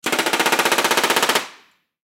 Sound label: Rivet Gun Run Short; Power Tools; INDUSTRY; Blastwave